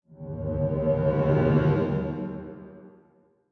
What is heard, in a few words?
grav ship; PC Gamer IT CD 40; SMACDEMO